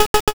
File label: picoeat